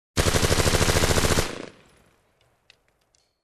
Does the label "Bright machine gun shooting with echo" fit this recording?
Yes